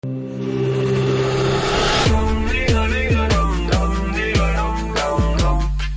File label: Telugu BGM Theme; 99 Songs Trailer